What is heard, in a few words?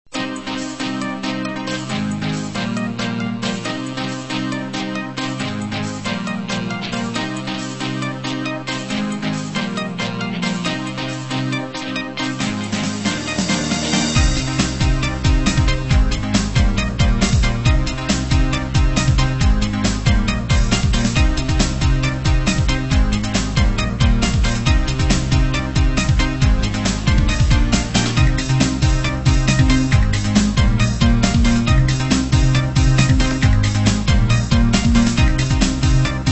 Pop